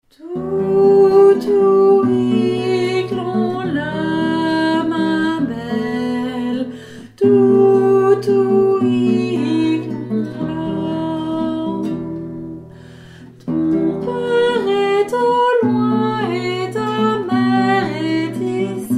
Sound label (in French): Toutouic lonla berceuse bretonne